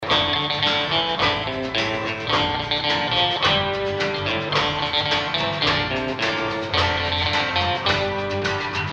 Western; Pain; Mr. Pain; ps3

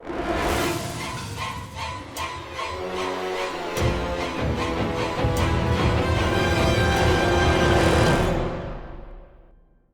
Structural Damage; bayonetta 3

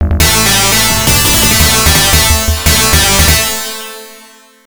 Game Over, Arrange; full throttle x68000

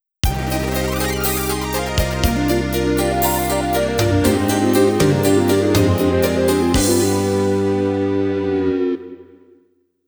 Game Over - Midi Roland SC-55 Version

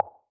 tile

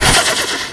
Turbo blow; car simulator 2

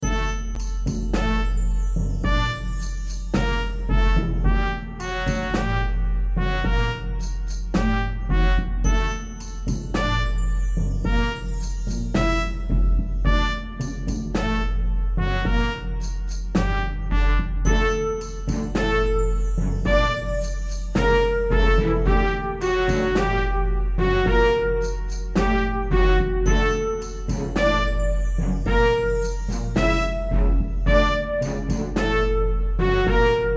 Orchestra; dragon fantasy book i the volumes of westeria

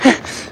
Fatigue Hard

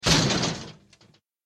Train Crash

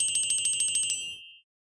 warp

